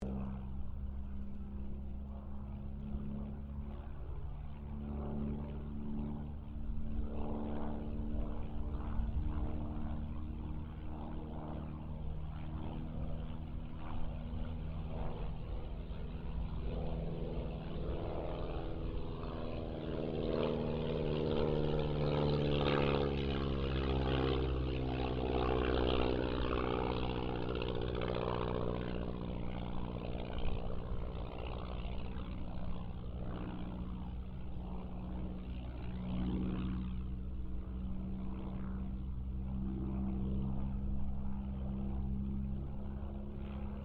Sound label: AIRCRAFT AUSTER III PASS DISTANT; 1945 Auster III; Airplane Sound Effects; Airplanes (Sound Effects:Vehicles)